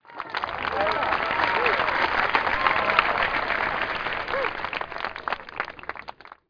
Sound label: crowd par win